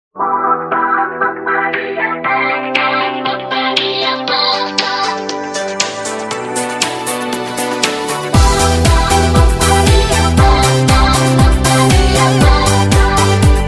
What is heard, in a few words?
ringtone dance music